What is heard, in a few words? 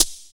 FX Dance